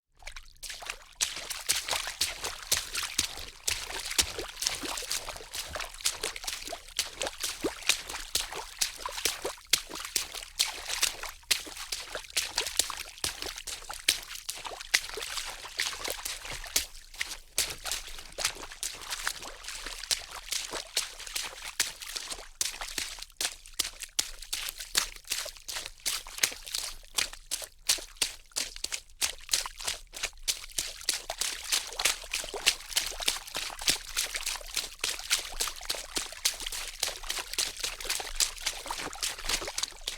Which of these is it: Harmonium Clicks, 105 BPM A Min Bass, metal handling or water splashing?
water splashing